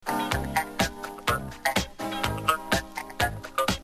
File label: beat